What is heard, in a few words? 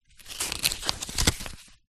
household book very small page turn; zapsplat